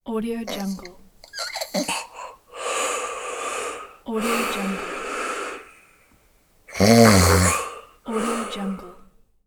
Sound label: yawns and snores